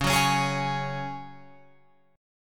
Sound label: Db Suspended 4th, Guitar, Open F; strummed; 13,13,13,15,16,15; Dbsus4